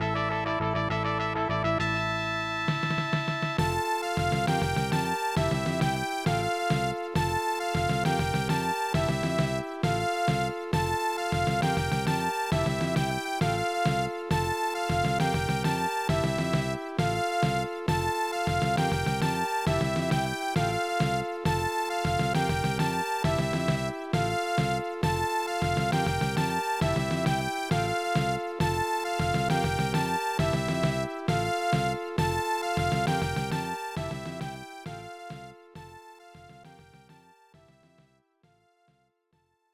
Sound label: Victory!; Daikaijuu Monogatari 2; SNES